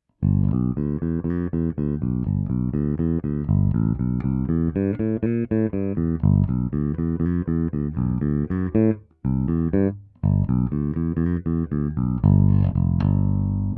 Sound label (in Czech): H-H prsty, poniklovaná ocel, Roundwoundy, Schecter SLS Elite-5 baskytara; D'Addario NYXL 45 - 130 Long; aktivní oba snímače, v dvojcívkovém zapojení; zvukový mód classic; hra prsty